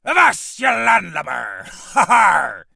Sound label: charge, Emotes; civ13; civ14